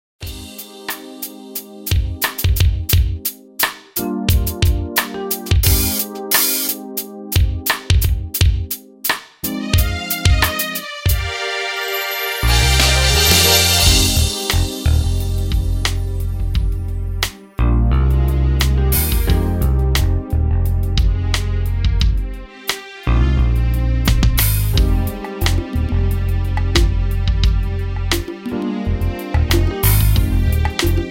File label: Jazz